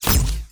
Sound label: Plasma